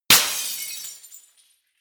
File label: glass smash